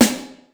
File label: SNR, Classic drum machines; SR16; musicradar-synth-samples